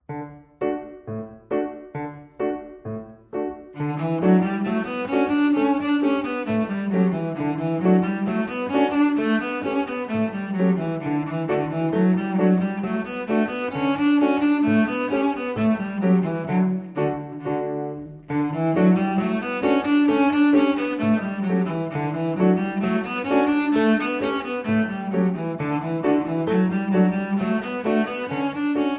Scale Reel, Cello; Fiedel Max goes Cello

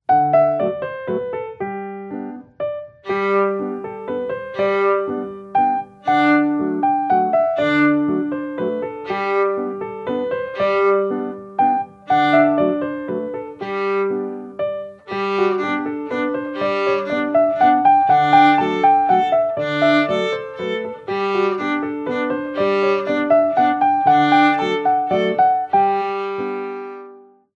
Slow Waltz, Violine; Fiedel Max Vorschule Violine

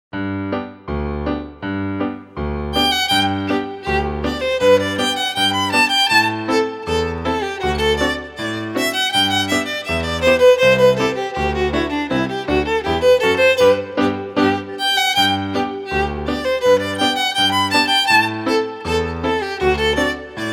Fiddle Tunes Traditional; Aus der musikalischen Schatzkiste 1 - Violine

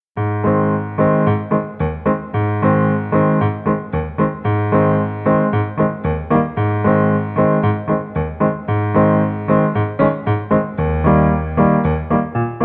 Bossa Nova, Viola; Fiedel Max 3 Viola

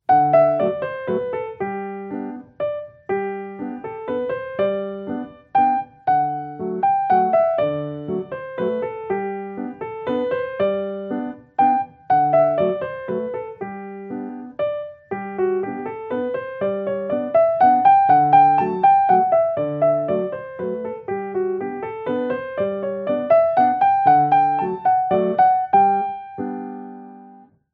Slow Waltz (Mitspielversion), Violine; Fiedel Max Vorschule Violine

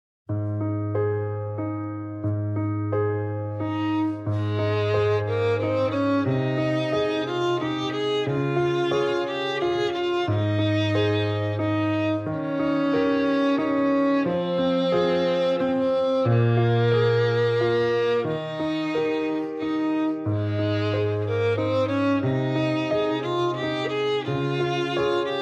Slow Waltz, Violine; Fiedel Max 3 Violine

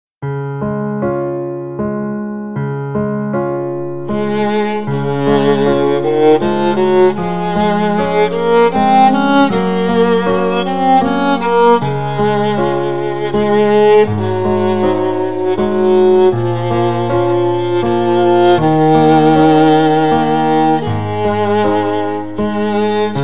Slow Waltz, Viola; Fiedel Max 3 Viola

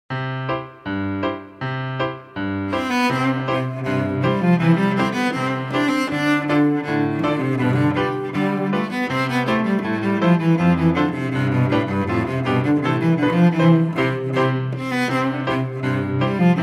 Fiddle Tunes Traditional; Aus der musikalischen Schatzkiste 1 - Violoncello

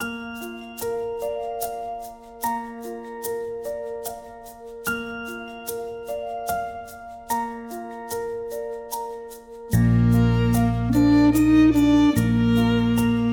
Meditation

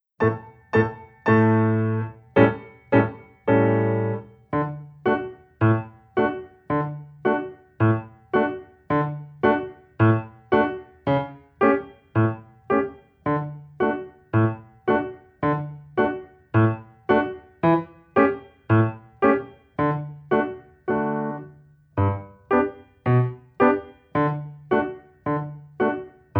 Playing Pizzicato, Violine; Fiedel Max 4 Violine

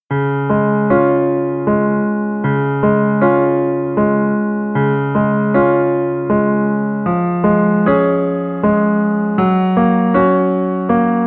Slow Waltz, Viola; Fiedel Max 3 Viola

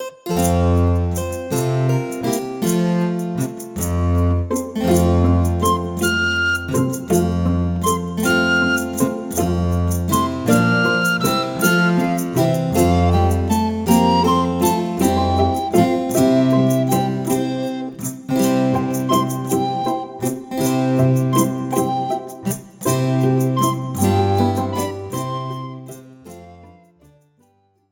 Counter Melody

